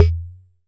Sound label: Jomox MBrane, drum machines; Jomox MBrane 11